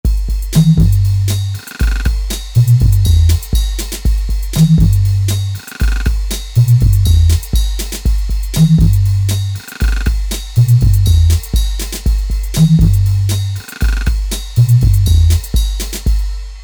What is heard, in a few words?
Drum Bass